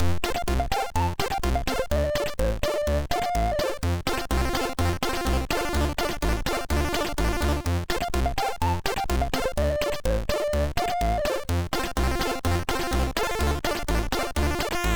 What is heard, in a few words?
Guldkornekspressen Lvls, MOS6581R2